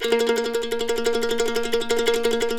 CEE TRILL A# R, Blues Guitar

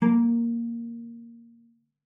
A#3, CELLO PIZZ; Xsample VOL-5

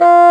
Bassoon

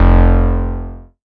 bass F1, Moog Minimoog

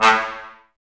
brass, Tbs Mute

